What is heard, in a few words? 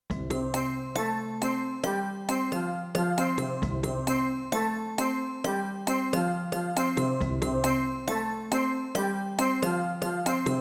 Island ambling, XV-88